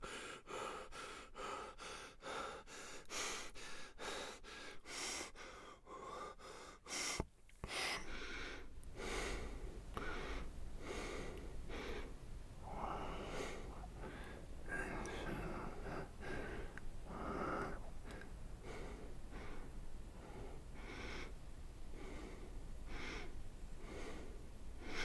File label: breath sprint; Escape from Tarkov